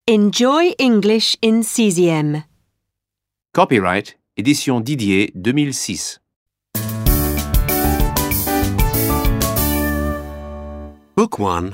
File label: alphabet rap